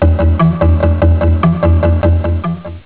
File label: african drum